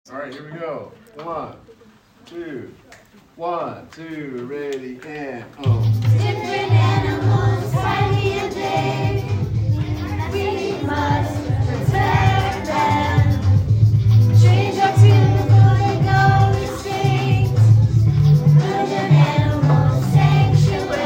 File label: Norris chorus practice